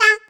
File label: Vo Main